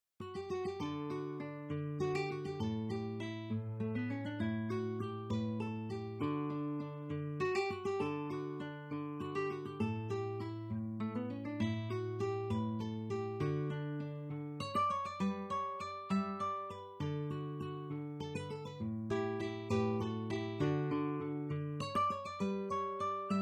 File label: spanish air